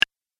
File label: Game Power Switch, video game